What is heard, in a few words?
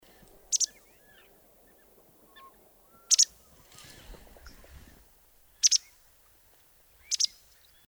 wwagt baicalensis; telmen nuur